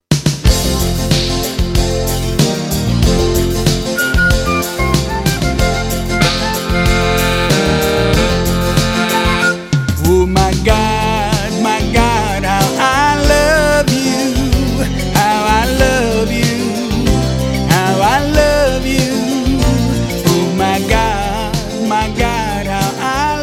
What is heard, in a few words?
God Chant